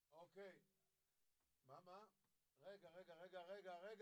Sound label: Floor